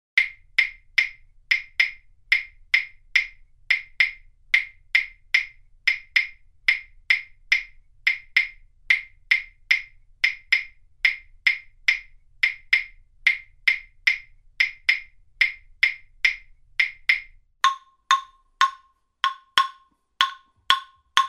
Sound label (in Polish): Drewienka klawesy; Przedszkole nr 1 Tuchola